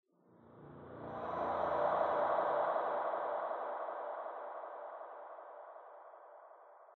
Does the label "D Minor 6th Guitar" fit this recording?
No